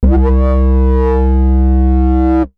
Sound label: Mechanical